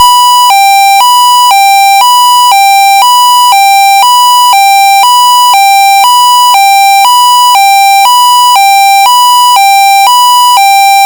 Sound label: Alternating UK, UK BS58391-1 (fire alarm); Alternating tone; pfannenberg; signaling-technology; combined-signaling